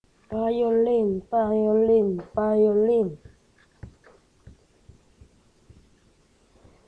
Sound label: violin